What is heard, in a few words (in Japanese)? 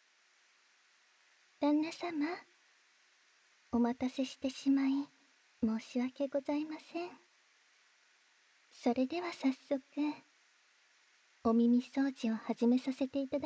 癒し 耳かき